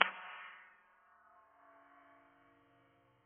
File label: Click